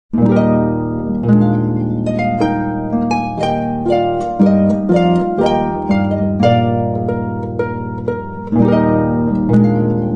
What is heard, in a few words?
Trumpet Voluntary